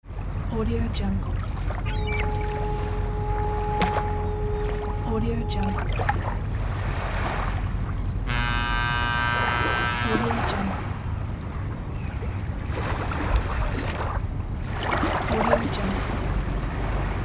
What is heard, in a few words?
sea port ambience